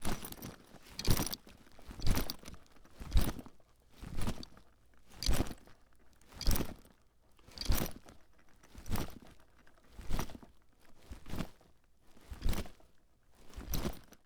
Sports Bag Duffle Bag Movements Walking Slow And Heavy